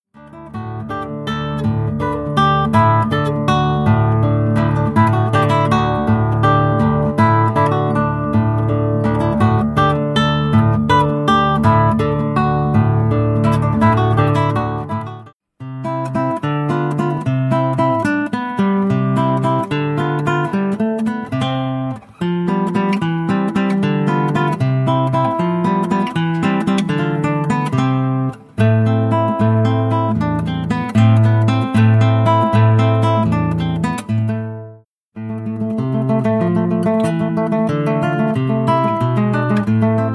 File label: classical